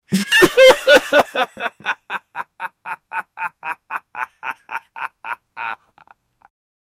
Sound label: laughter of a rich man, arrogant; SFX Engine